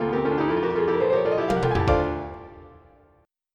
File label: JING; die ii; MT32